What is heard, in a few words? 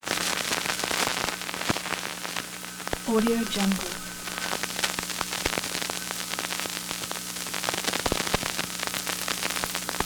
futzed optical track line feed from moviola; prosoundfx